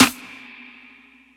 snare; dough-samples; eddyflux

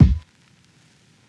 kick; eddyflux; crate bd; dough-samples

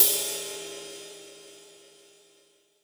Br Rid C, Boss DR660, drum machine

